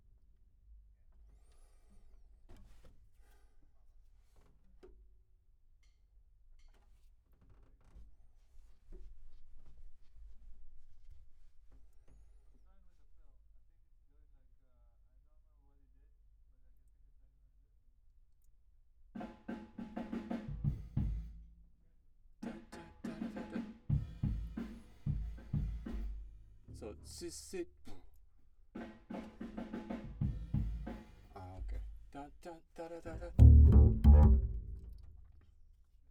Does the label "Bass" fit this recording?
Yes